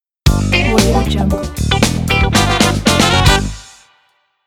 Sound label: funk logo